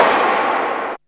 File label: INDUSTRY